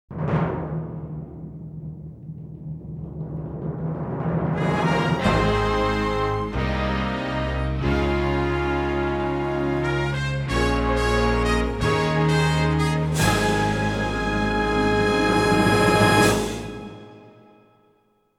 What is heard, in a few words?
Fanfare; Network Production Music; NM092 Above & Beyond (Multimedia)